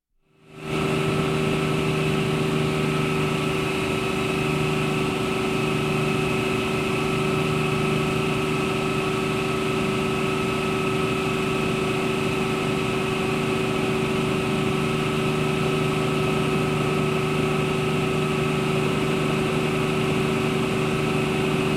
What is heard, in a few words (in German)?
Luftwaffe Fliegender Hubschrauber an Bord